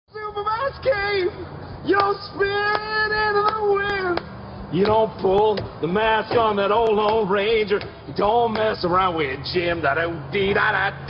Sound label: singing; hopper